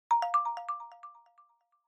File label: Smartphone Alarm Dry